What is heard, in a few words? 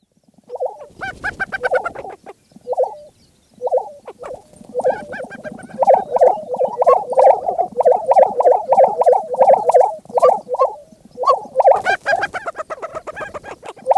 Lesser Prairie Chicken